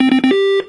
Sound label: beep